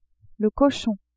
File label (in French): cochon, animaux